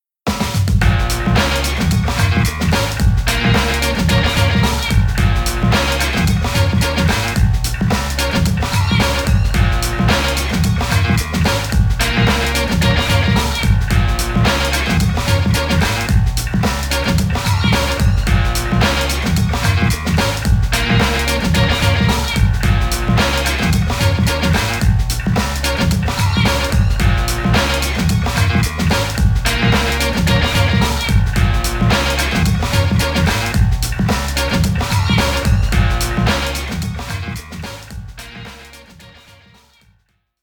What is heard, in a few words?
Street Dance; Street Fighter 6 Beta; Xbox Series X/S